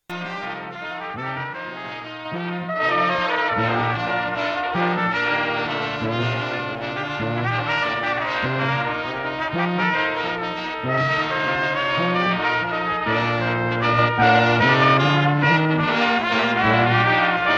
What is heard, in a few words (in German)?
Blasmusik